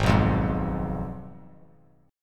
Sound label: G sharp Minor 6th G sharp down